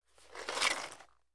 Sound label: Roller Blading; Roller Blades Being Picked Up.